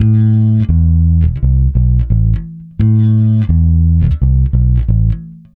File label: Bass A Funk